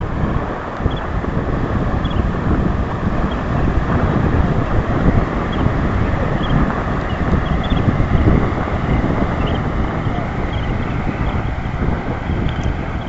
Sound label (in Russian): krasnozobik golos